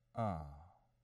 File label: bad ohh; angband